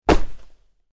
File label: Cloth Character Movement Foley Whoosh; Miscellaneous Human Sound Effects; LynneMusic